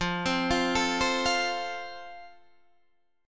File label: F Major; arpeggiated